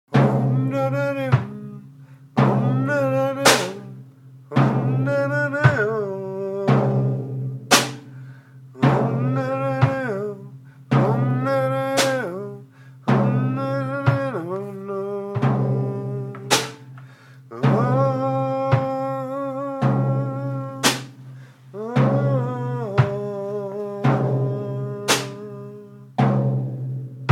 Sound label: Ancient Rhythms, jam